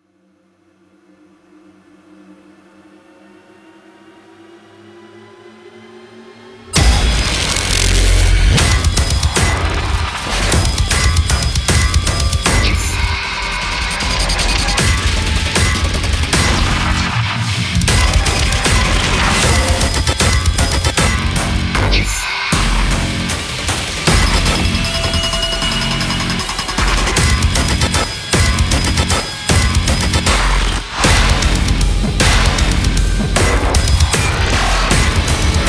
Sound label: Battle Loop; Soldat